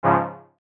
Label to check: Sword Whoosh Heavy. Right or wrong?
Wrong